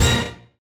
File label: funny orchestra hit (f#)